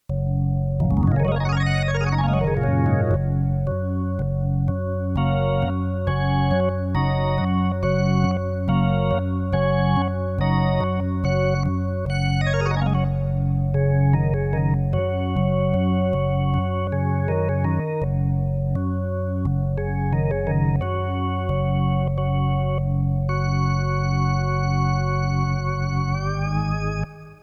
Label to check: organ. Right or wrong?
Right